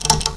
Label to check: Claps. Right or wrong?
Wrong